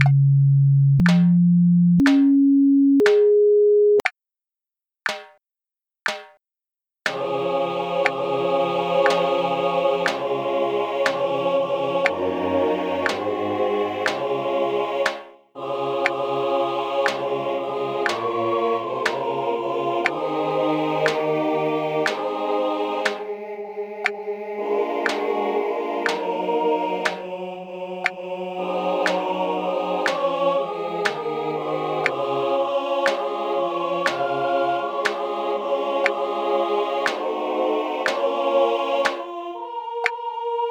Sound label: benediction click